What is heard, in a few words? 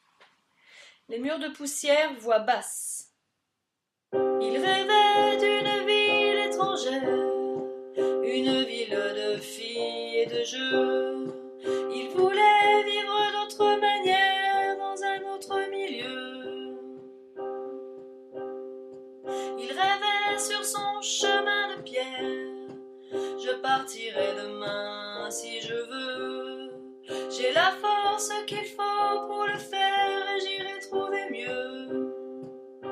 Basses; les murs; pupitre basses